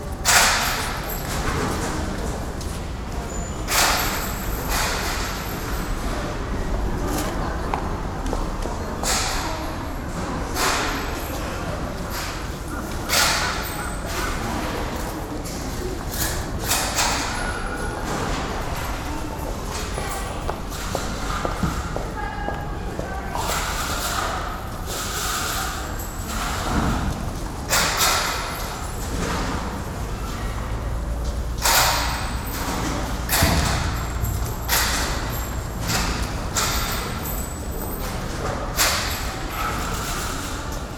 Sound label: Urban train station entrance; urban and suburban; boom library